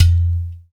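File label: percussion udu; Roland LCDP14 Africa VOL-2; Afro Menu 1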